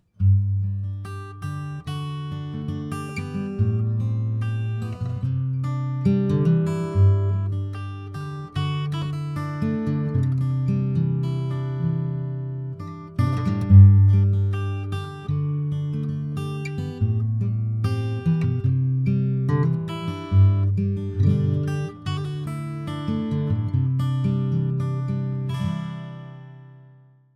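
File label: guitar soft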